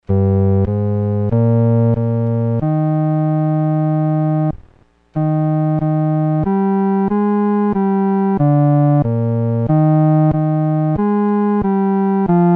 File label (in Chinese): 独奏 第四声